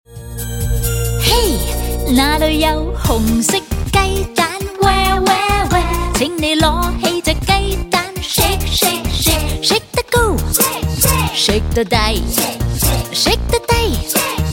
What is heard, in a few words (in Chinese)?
正向心理兒歌